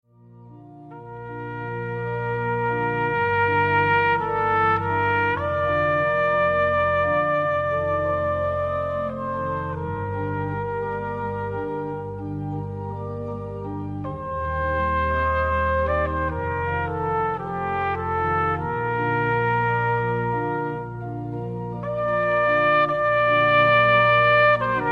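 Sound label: trumpet ave maria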